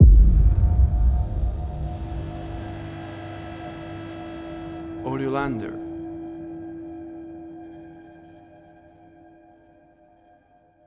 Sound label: Corrupted 138bpm; Suspense, Drama, Quirky, Emotiona